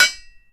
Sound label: Hit Golfball Metal; SFX Kit; Misc Sports